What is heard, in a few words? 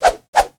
Swords Attack Underlay